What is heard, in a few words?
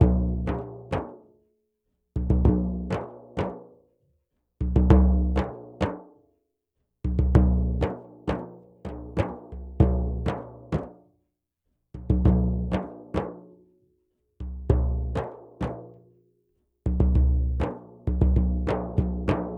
duff 098, Hip Hop India, 098bpm; Hip Hop India Modern Percussion; Apple Loops